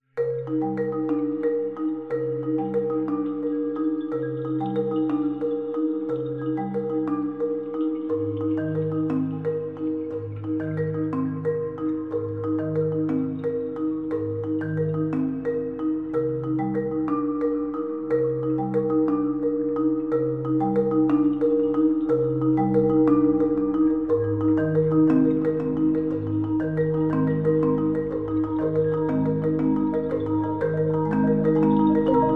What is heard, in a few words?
Marimba Drops